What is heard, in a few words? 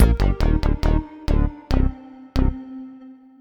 gameplay end stinger; Nickelodeon Basketball Stars 3; ThrillGames